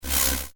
Jagged Chaos Whoosh; Andrew Fleming

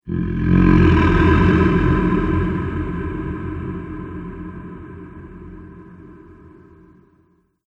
Ghost Egyptian Phantom; Ghost Sound Effects

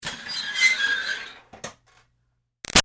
Screech Metal Iron Board Slow Close; Screech , Metal , Ironing Board , Slow Close; Materials

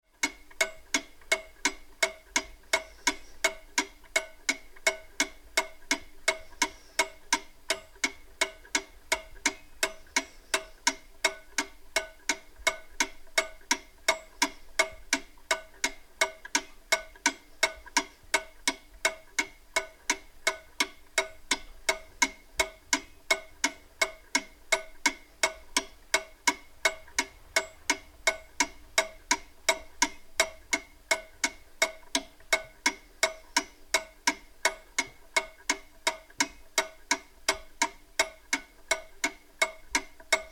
Clock Tick; Tom Hutchings

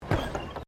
Footsteps Boots Suspension Bridge Wood Wire Step; Footstep Foley; Human

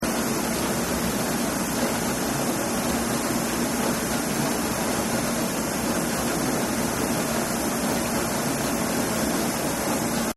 Dishwasher; Loop; Appliances; Sound Effects:Household; Partners In Rhyme

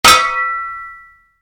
Spade hit, Shovel, Impact; Yard Tools; Stuart Duffield

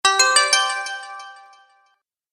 Bright Bonus Win, video game

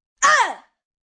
Pain Uh, Grunt, 1 child; Impact; Voice Box

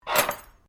Cutlery general pick up, Household cutlery

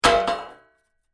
Metal Impact Drop Metal Pipe On Metal; Large metal pipe impact with metal; Metal Sound Effects

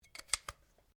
RIFLE CZ 452 VARMINT 22 CALIBRE MAGAZINE ENGAGE; A selection of domesttic firearms; Rifles

